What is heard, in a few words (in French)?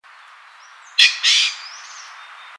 faisan dore, chant